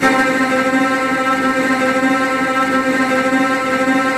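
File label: STR TREMOLO, Virtuoso Strings, C3, left; ILIO; Kirk Hunter Virtuoso Strings VOL-3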